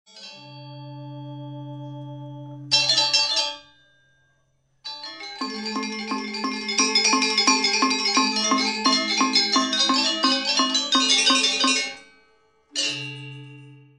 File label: Gamelan Bali